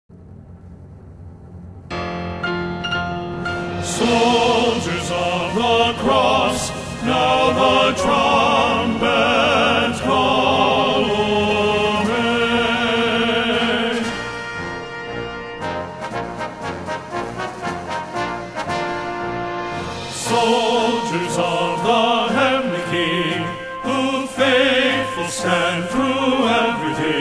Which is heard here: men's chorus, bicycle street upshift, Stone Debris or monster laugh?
men's chorus